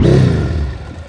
shot; gp500

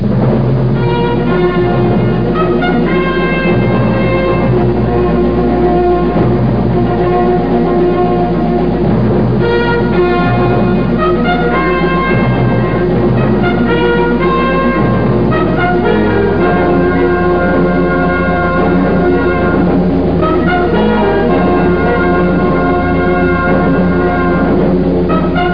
theme; dogwar

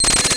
reject, computer beeps; environment

